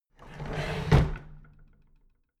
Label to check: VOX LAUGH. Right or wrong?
Wrong